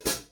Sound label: hat